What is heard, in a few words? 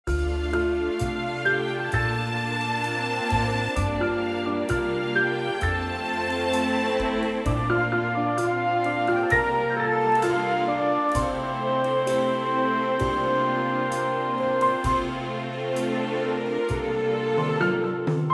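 orchestral F G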